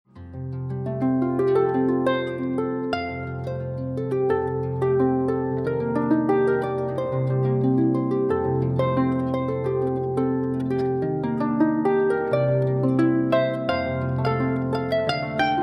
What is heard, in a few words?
harp music